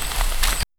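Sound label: walking on the wood, footsteps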